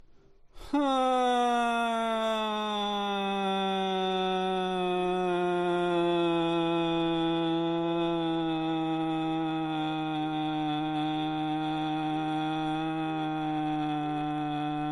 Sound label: huuuuhh